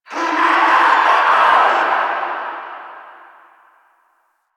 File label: Exterior Foreground Infested; Warframe; Ambience; Nineteen Ninety Nine